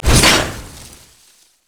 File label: bushwhack hit; nidalee base